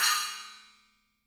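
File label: Cymbal, Real Drum Kit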